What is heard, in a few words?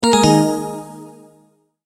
multimedia tone end